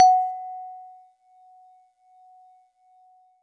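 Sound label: Celeste DX100 F#1; Pristine Celeste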